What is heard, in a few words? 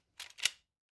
Shotgun Loading; SoundsCrate